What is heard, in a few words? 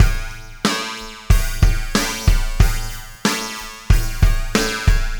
Robotic Beat; Processed Drumkits